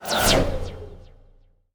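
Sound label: Cemetery Absorb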